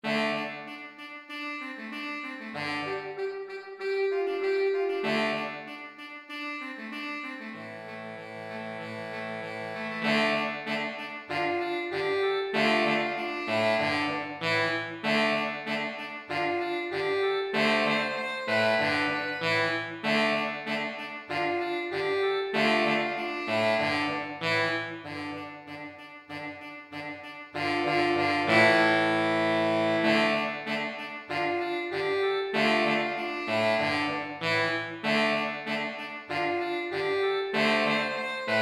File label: Sax